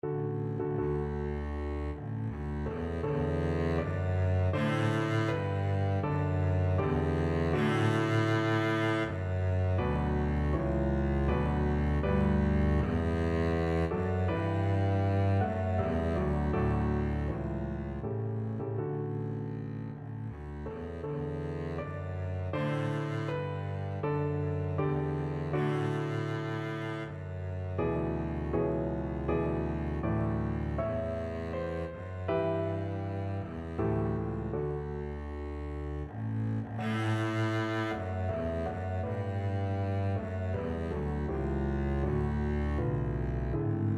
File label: mendelssohn nocturne, double bass